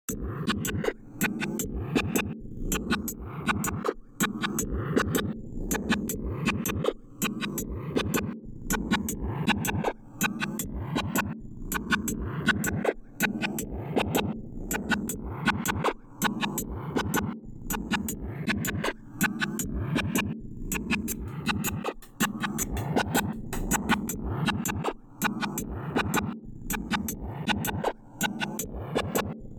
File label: dub; jeskola buzz